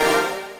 JV KIT; Pro-Rec - Synthsonic; JV ANALOG1

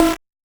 Noise, 8-bit, Bitty Noises

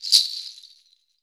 beads

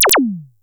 Disco Zappa, sci-fi

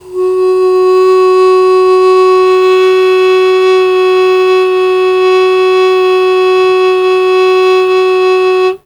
Long Sustain Aa F#1, Harmonica; Zero G - Harmonica

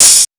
HH OPEN, Fresh Disco House

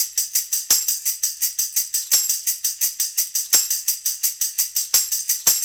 Tambourine, 70s Analog Funk; Sonic Foundry (Sony Creative Software); What it Is! 70s Analog Funk; Session 15 - Wild Child

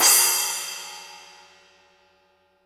CYM, Real Drum Kit, SWING KIT; Best Service ProSamples vol.43 - Real Drum Kits

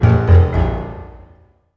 GRO, Orchestral Loops; 128 COAST ROAD

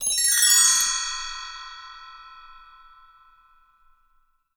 CHINESE, BELLS CHIMES; Voice Crystal - Steve Reid Definitive Percussion

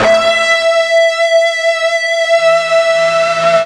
FUZZ E 4, Guitar; East West; Steve Stevens Guitar Samples Collection

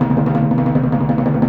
Timpani, Orchestral, Rock Pop; Sonic Foundry (Sony Creative Software); Orchestral Series VOL-4 - Rock and Pop

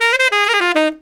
SAX LICK, BRASS LICKS; Ueberschall; Jam Box

